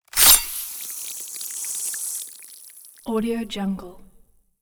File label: gore weap throat slit gore; soundmorph